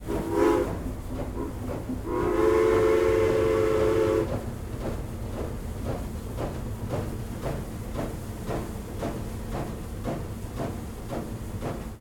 train steam 4 6 4 royal hudson h1e class whistle engine pov tired while moving; sonniss gdc